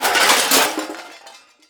dishes drop

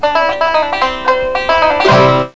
BANJO

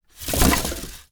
Firewood pieces drop

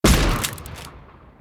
Shotgun fire

